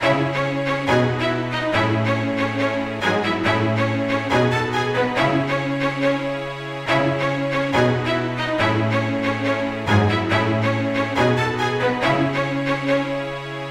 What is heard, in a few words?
String Loop 140 BPM C# Min; Instruments; String Loops; Cymatics; EDM Starter Pack